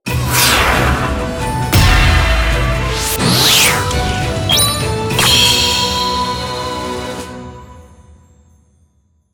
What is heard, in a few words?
jingle limit break; sengoku taiga android ios mobile gamerip 2018